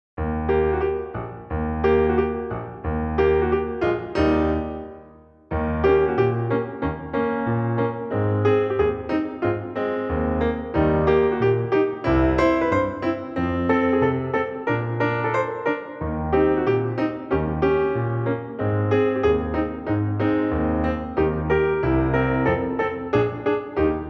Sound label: Eb accompaniment